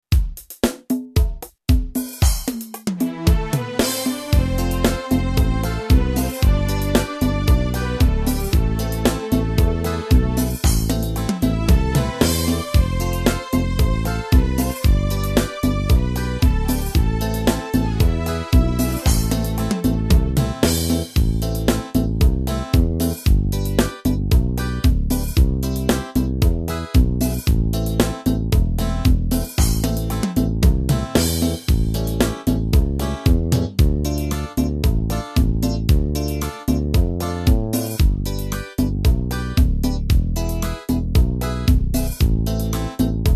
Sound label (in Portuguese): ritmos yamaha seresta